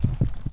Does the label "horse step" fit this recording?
Yes